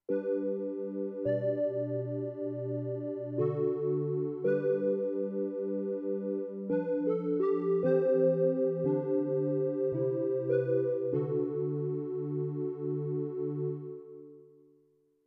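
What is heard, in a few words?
Square Pad, digital piano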